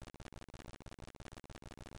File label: Silent; zoriarpgcom